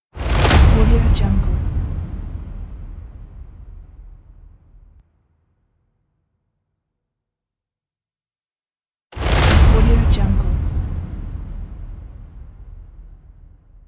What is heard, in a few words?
roll hits